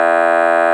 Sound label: error buzz; boss 2 intro